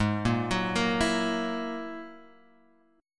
G sharp 7th Suspended 2nd Sharp 5th, Guitar, Open G